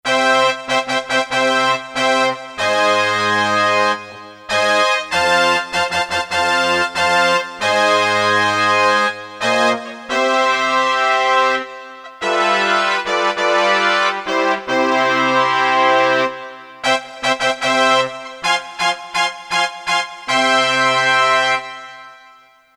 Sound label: TRUMPETS; Korg DSS-1; Brass & Sax